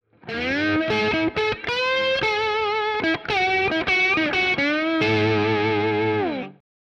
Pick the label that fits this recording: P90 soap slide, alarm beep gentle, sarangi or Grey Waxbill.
P90 soap slide